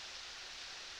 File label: Background Noise